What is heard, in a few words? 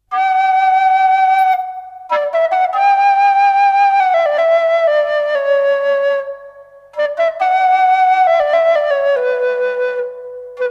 Shrill pipe